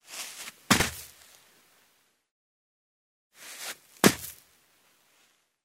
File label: Barefoot jump in the sand; steps